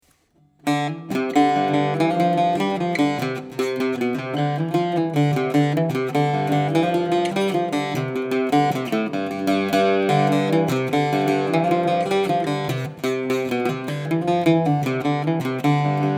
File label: cello